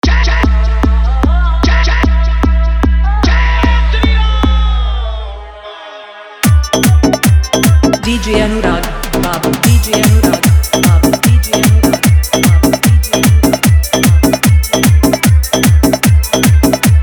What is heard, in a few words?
Bhojpuri Desh Bhakti Hard Bass Mix